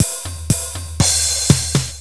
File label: Drum Song; Mythos